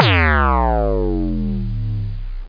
Failed; Top Spin 2; GBA

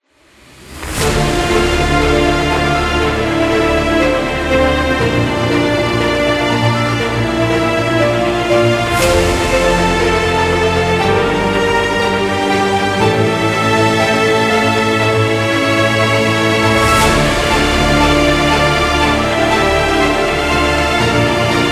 Short Dramatic Cinematic Theme; Dual Blade: Battle of the Female Ninja

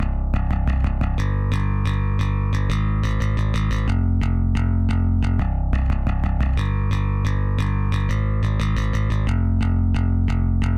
Drums and Jingles Battle Bass A

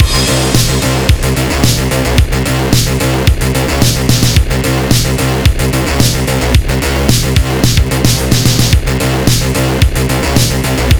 boss level loop

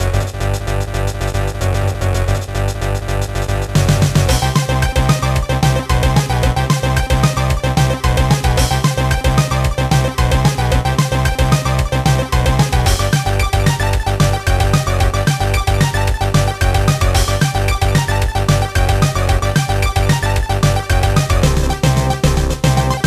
Boss Battle; CT Special Forces; GBA gamerip

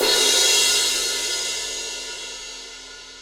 Crash; Torchlight III; PS4

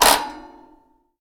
Door Timer Unit Time Up; Prison Architect

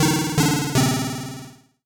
Solo Chip C64 Negative; MUSIC EFFECT; startide

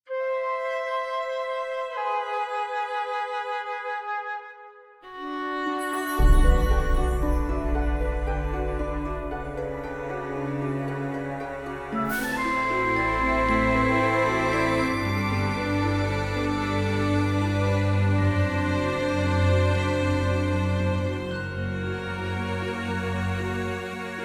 peaceful docks; pirate mysteries android ios macos mobile windows gamerip 2012

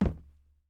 plastic land

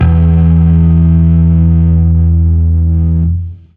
Fuzz E harm

